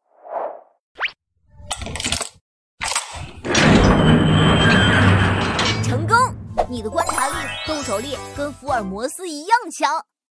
open door

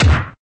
click

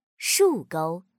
stroke

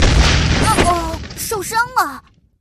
hit

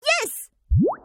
hit

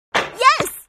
hit

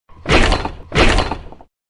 wrong

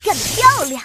hit

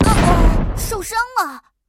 hit

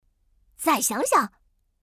wrong

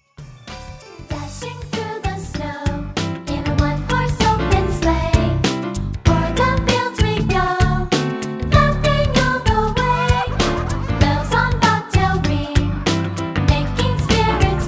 christmas bg music

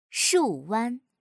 stroke

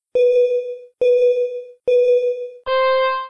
count down

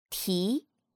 stroke

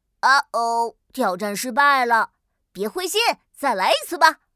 fail

